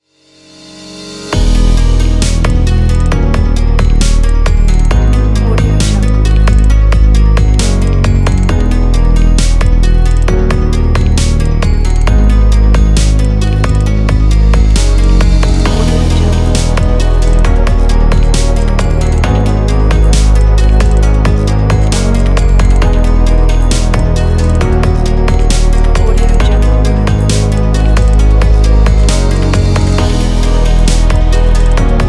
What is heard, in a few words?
technology calm ambient